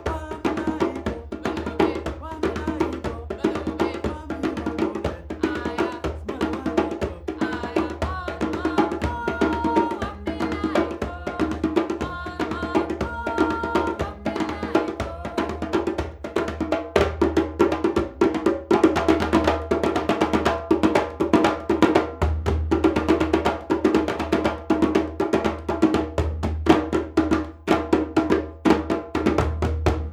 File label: West African Drumming